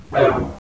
blast, houndeye; svencoop; schall

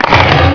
grue attack; svencoop3

